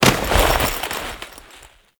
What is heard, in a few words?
Foley Ledge Grab; Warframe; Characters; Kahl